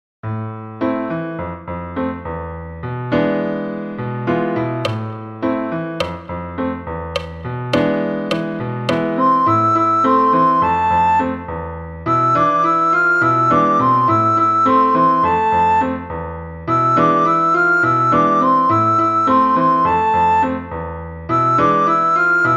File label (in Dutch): sopraan; Flierefluiter 5